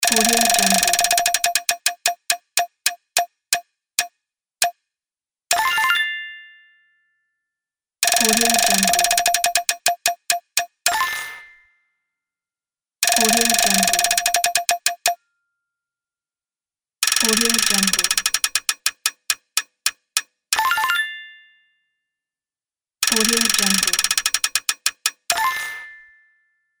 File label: prize wheel